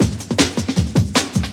'Cution Beat; Sonic Foundry; electrocution

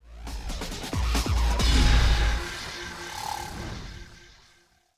Exit Windows; Lara Croft- Tomb Raider- The Cradle of Life

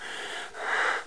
Breath Medium; Player; HereticII-Demo

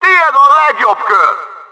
best lap; CRC2005; CRC 2005 Demo

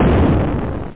new space cannon; alienbreed3d2

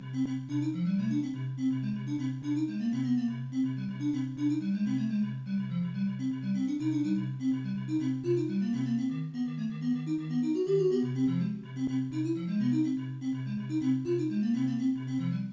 tune; blix

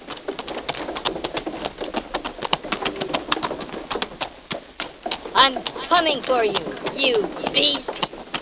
windmill

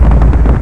dig

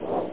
SFX flap

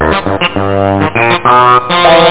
computer

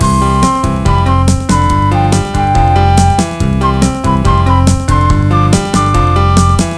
Gumballs Music; Kids Arcade Pak Demo; Gumballs 1.0 Demo

